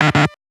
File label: notifications; Nothing Phone (2); lonba